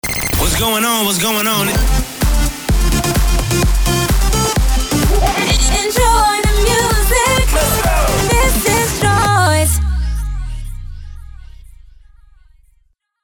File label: Remix Jingle